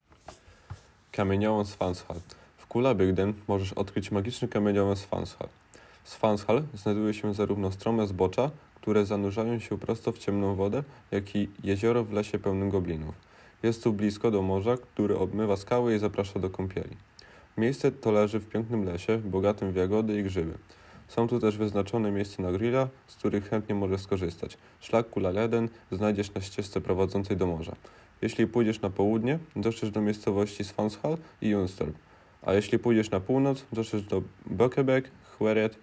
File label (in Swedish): polska; Svanshall stenbrott